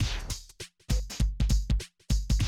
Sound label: drum loop, 100 BPM